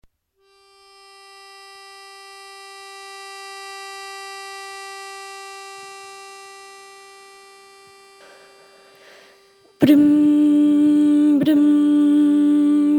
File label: bari predominant; I saw three ships